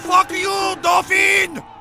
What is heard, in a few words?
dolphon